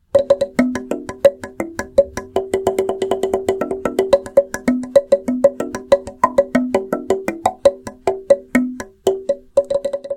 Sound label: Log Drum Sapelli